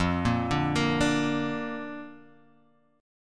F 6th Suspended 4th F, chord; F64 arpeggiated

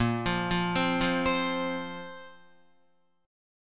Bb Suspended 2nd, Guitar; arpeggiated; chordshape

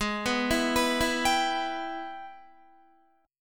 Ab Minor Major 7th Sharp 5th, down, Guitar; arpeggiated; JGuitar

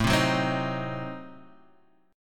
A Diminished 7th A down Guitar Standard; Adim7 strummed; JGuitar

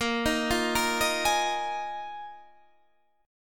D sharp Suspended 2nd Suspended 4th; chordshape

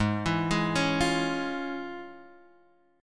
F Minor Major 7th Sharp 5th, chord

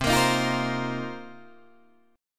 C Minor 11th C Guitar DADGAD; Cm11 strummed

